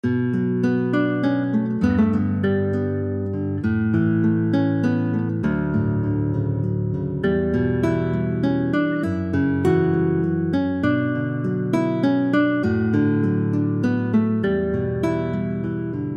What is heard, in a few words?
guit